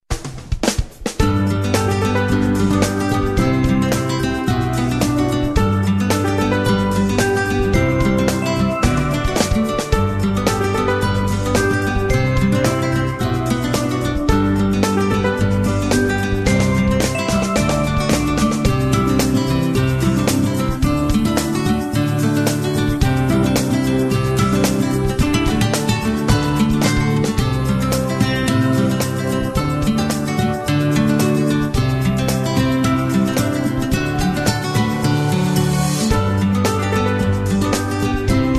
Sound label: in game; HeroRush